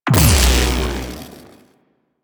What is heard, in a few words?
Mech Shield Mod; Warframe; Vehicles; NechroMech; Abilities